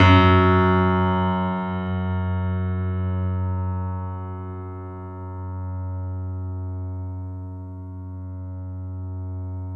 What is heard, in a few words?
F#, piano sustain; InVision Interactive; The Piano